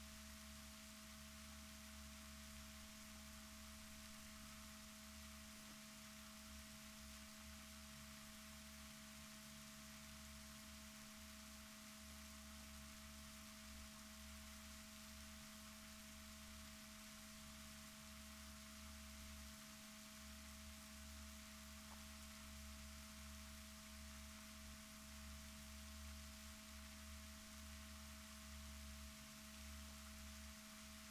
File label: rorquals positif, Balaenoptera Physalus Chile